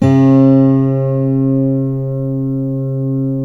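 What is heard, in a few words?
GUILD 12-string, Guitars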